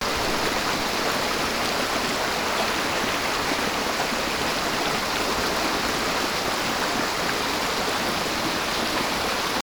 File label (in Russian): vodnij potok techenie vodi